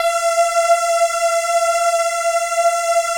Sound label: ATMO PADS; Best Service; XX Large